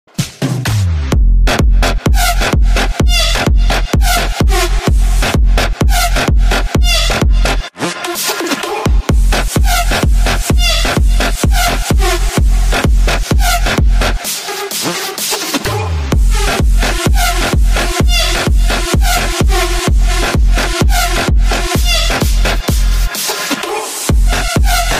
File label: Woofer